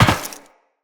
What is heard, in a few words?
Land Metal Perforated, Footsteps; Characters; Tenno